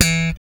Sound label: 6 STR FUNK; Spectrasonics; Bass Legends